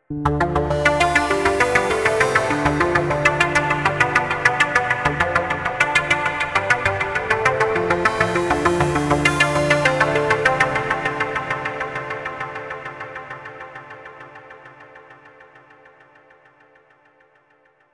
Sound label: Sequenced Somber Tranz